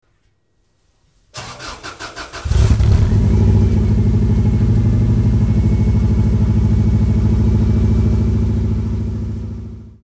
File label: GC8C Engine Start